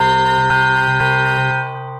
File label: Rock Organ, Waveframe Keys; Phuture Rock Organ; Ableton; Retro Synths